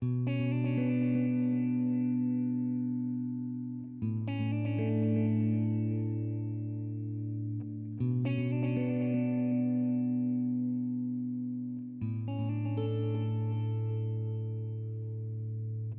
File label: Guitar Loop 120BPM Bm, chords & melodies; 99Sounds; Monosounds; Music Loops; Instrument Loops; Guitars